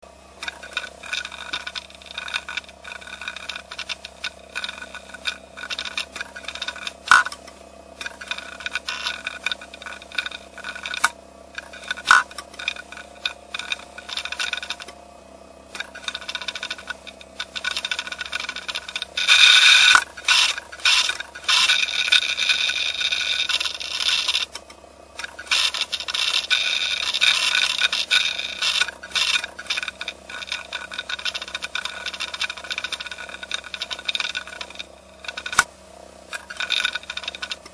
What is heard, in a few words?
hdd error